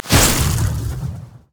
frost scorched earth; LoL; shyvana

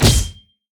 riposte; LoL; fiora

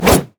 basicattack; renekton

